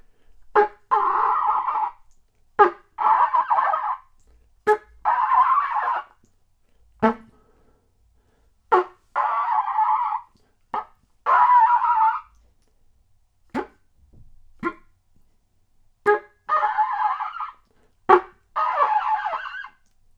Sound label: bird